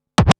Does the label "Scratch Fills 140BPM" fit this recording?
Yes